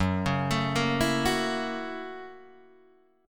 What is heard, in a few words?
Gb 7th Sharp 9th Gb Guitar Open D